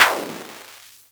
tek Pop really dirty snare; Ableton Foundation FreePacks; Wicked Kits

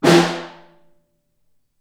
Snare Far

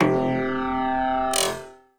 Scan Loop Small Grains; Ableton; Granulator III; Device Templates

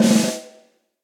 Snare Press; Snare Articulation; Drum Hits; Cold Tight Room; Session Drums Studio; Standard Hits

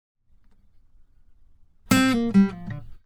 077 Pop Ac-Guitar Fill Dm; Pop Rock

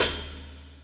Hat Edge Open Half, hihat; drum hits; Ableton; Factory Packs; Drumdrops Recording Hybrid Kit

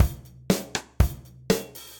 MPE Kit Room With A Vista, Session Drums; Ableton; Session Drums Studio

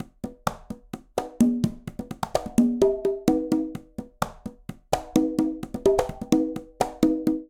Cha-cha-cha Conga Quinto Tumba-128bpm; Latin Percussion; Ableton; Factory Packs

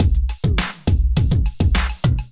Kit groove 103 bpm; MIDI Clips; Hilda; Ableton; Drum Essentials

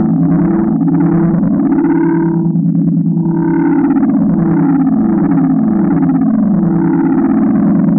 Machine from 2077, Harmonic Drone Generator

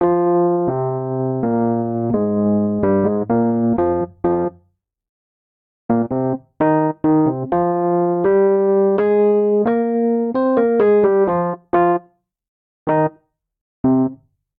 Vamp Dub F Minor 64 bpm, Electric Keyboards; Ableton; Factory Packs

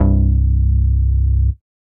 Legend Bass; Synth

